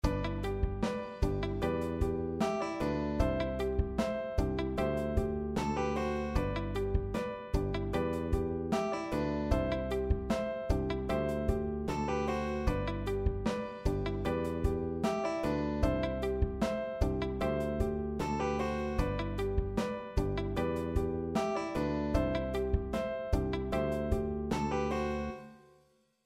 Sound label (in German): Bandpattern Popballade; Popularmusik